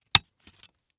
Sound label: Electronic CD Case Remove CD